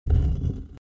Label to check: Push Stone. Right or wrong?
Right